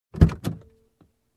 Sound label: Open Car Door